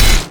heavy blade impact; weapons; impacts; dota

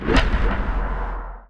echo katana cast; weapons; dota; kez

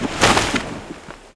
treant spawn; weapons; dota; furion

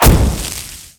searing impact; weapons; dota; clinkz

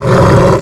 death thunderlizard, creep deaths; dota

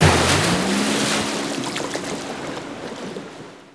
taunt backstroke; tidehunter

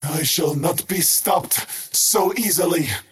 skywrath crown move pain; skywrath mage

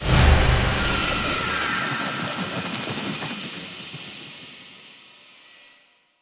arcane bolt cast; weapons; dota; skywrath

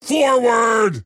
move pain; dota; axe

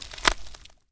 foley, footsteps; physics; undying

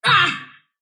sylph pain; dota; dark willow

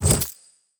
chest pickup, inventory; ui

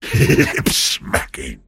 last hit pain; pudge

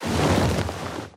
wings; gore magala; mh event

